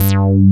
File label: DPPLS BASS; Pro-Rec - Synthsonic; BASSES